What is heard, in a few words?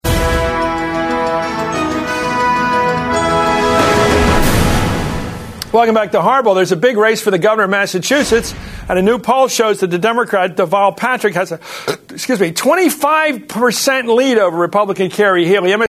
burp